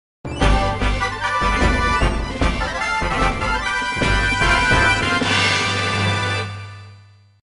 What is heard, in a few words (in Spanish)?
Tema de victoria; Pokemon; SSB